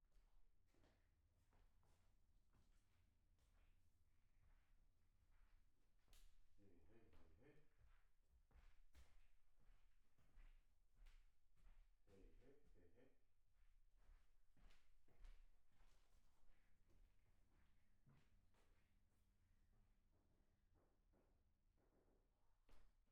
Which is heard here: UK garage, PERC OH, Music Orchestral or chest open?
PERC OH